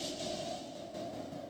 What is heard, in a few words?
Industrial 160